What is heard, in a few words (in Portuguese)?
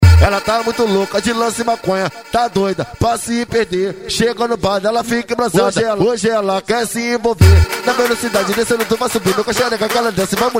funk agressivo funk